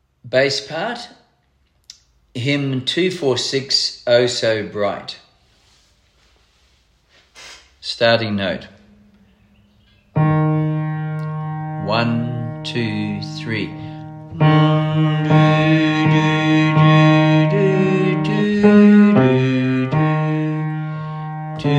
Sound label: Bass SATB; O So Bright; Hymns for Jubilation; in house choir